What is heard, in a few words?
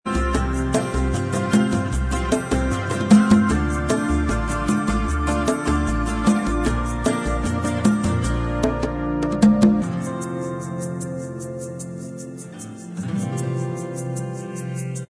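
acoustic instrumental track, backing tracks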